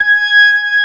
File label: B3 G#5; Best Service; Advanced Media Trax Modern Composer; B3 SMALL2